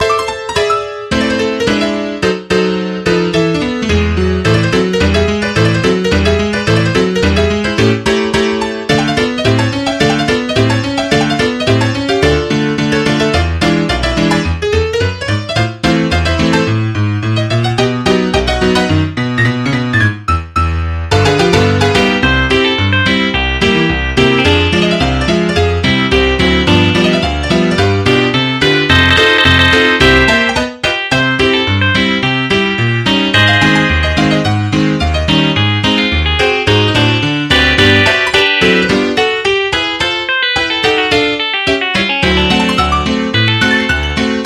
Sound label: Folk Song